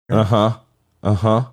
huh uh huh

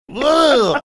making disgusted sound

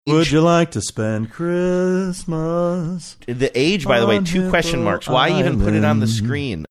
singing

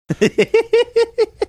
cute laugh

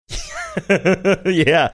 laughing saying yeah

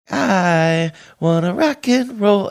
meekly singing i want to rock and roll

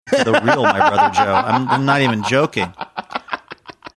having good laugh

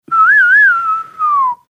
whistling

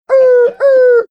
making air horn sounds

